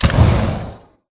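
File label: Tick Movement Traverse Jets; Titanfall 2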